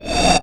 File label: SNEER, Anime Sound FX; COMIC